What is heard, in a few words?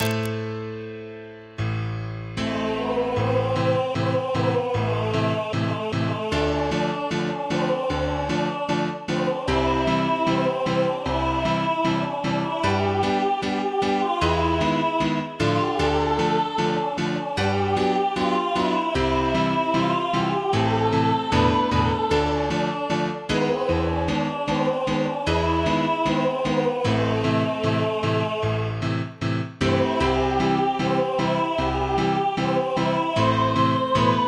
Piano; This is the anthem of Zabaykalsky Krai, a region of Russia